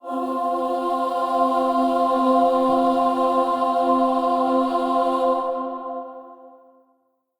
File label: Kuva Lich Requiem Mod Choir E